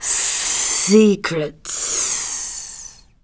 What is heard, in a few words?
Snake